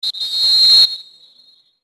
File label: whistle; csgo